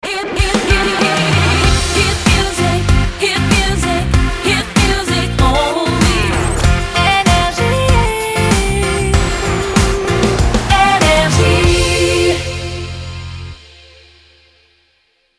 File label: jingle; NRJ